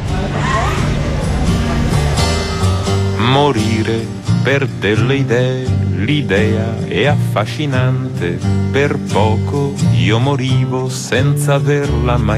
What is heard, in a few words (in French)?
Chanson en italien